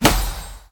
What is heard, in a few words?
grapple connect; Scrat